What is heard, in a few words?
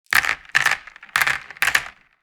Board game map token throw; leisure